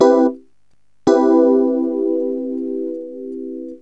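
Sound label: major 7th, synth chime; cubase; CUBASIS; KEYBOARD; RHODES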